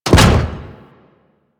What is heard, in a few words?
Arachnoid Camper Step Default; Warframe; Corpus